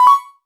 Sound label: se type